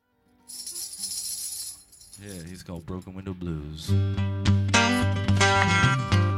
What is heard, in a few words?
Blues